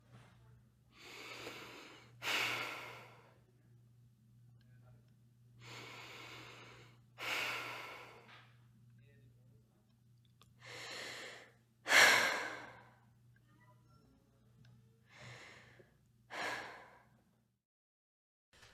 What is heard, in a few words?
Girl Breathing Sound